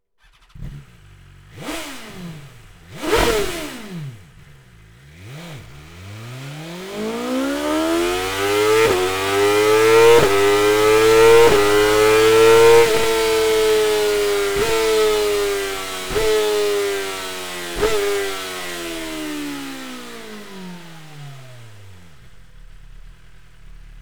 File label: SLIP-ON LINE CARBON BMW S1000R